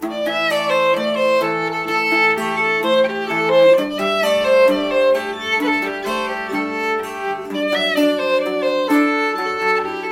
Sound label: instrumental